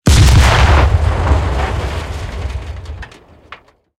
tank aphe explosion

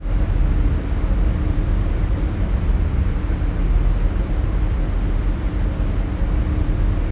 ship engines; World Of Warships

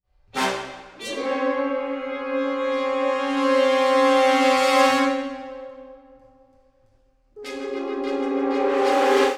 music action theme brass

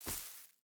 footstep hide grass run; Rust

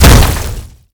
Projectile Sounds; Red Orchestra Ostfront 41-45

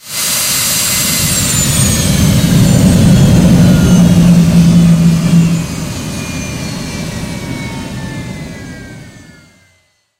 jet engine stop cockpit; War Thunder

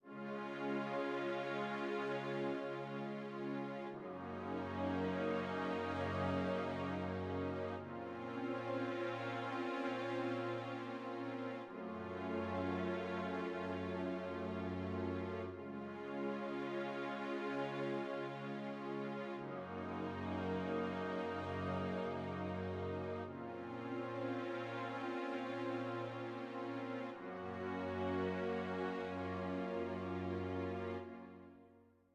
music interactive; World of Tanks

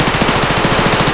mpx indoor distant loop; Escape from Tarkov

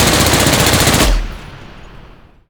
plane; World of Tanks

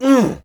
hit; Escape from Tarkov; scav2

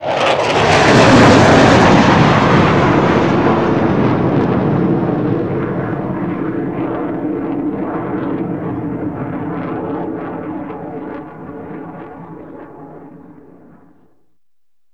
vehicle mig29 flyby long

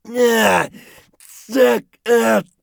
agony; Escape from Tarkov; scav2

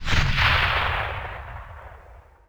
grenade launcher explosion tail

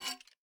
ui pickup metal medium rattley; Rust

